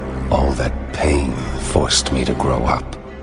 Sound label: pain sound